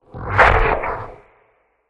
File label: Tennis; Sfx Tennis